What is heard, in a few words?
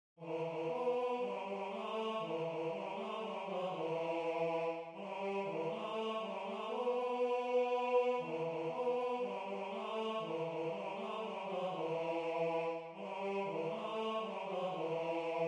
Al Shlosha Dvarim, Synthesized, two-part harmony; This was in two-part harmony.; As recalled by Ernest Davis